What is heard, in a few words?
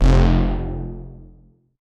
sad synth one shot; melodic